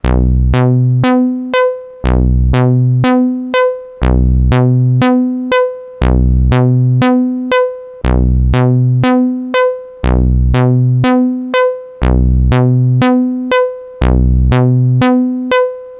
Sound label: korg arp odyssey filter; Korg Collection